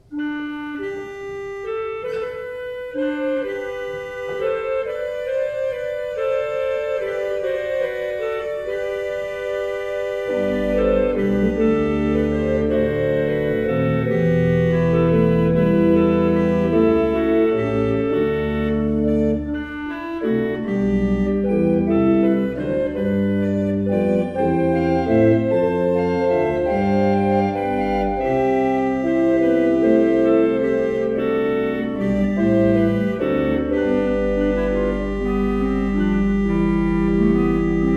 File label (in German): Fuehrer, gebrauchtorgel